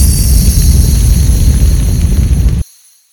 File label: flame